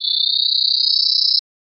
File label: Bug Screech